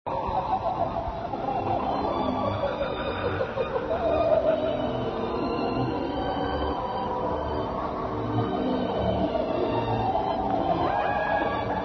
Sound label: ghost music